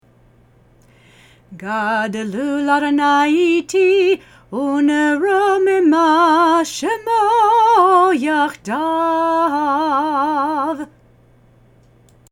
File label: Gadlu Chanted